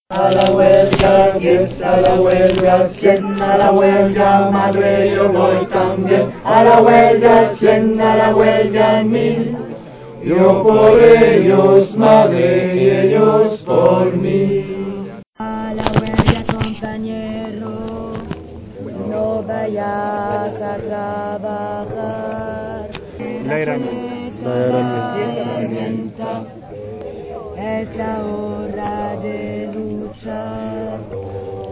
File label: tenor